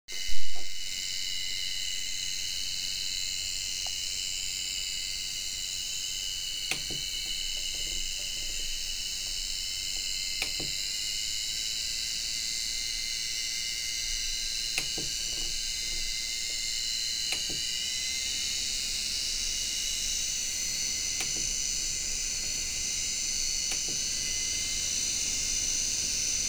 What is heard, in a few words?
fan whine